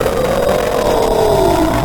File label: Scream; Slouch; MiSide